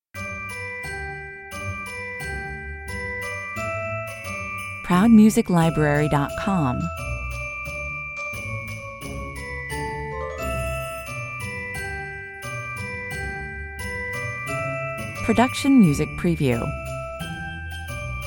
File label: christmas jingle bells 88 bpm